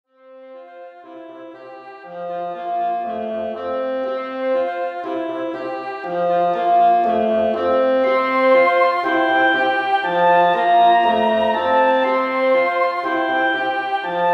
A Classical Interlude, music; Musical Sound Effects; audio logo, web background, video game music

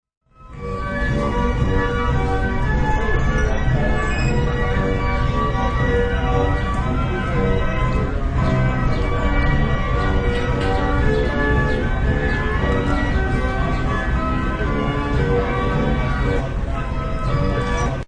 Merry-go-round music, clear recording.; Rides; Games

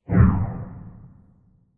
Low Short And Fast Science Fiction UFO Transition; Crafted Signals

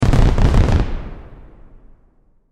Explosion Fireworks Short Burst; Chinese New Year

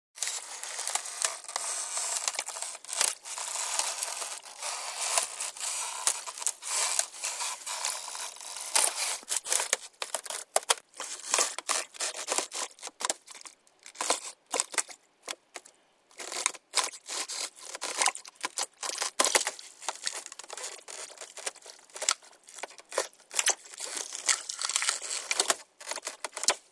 Ice Creaks, Creaking sound of footsteps on ice.; Snow; Weather

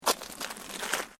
SNOW CREAK

